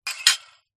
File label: household plate broken piece down on other